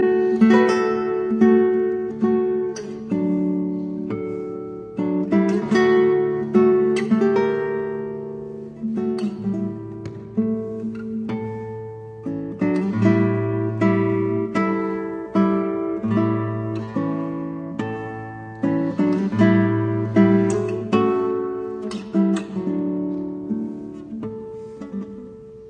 Triste; Aguirre

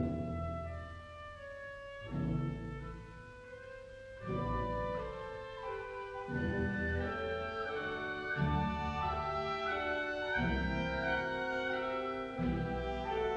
Symphony in E minor Allegro energico e passionato; Brahms; Op 98

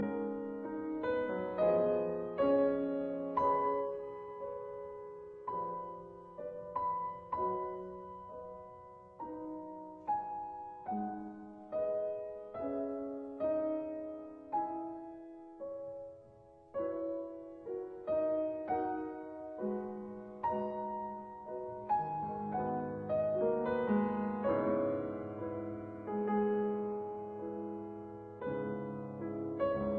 Piano Sonata in F-sharp minor, Andante; Op 23

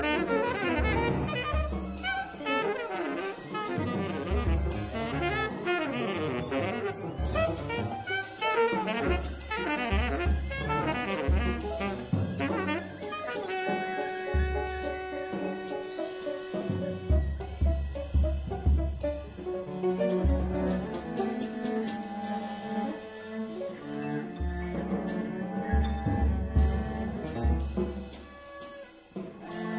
Quartet

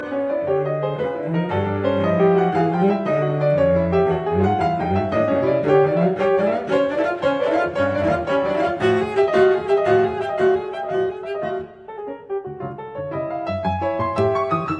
Cello Sonata, Scherzo Allegro; Schifrin

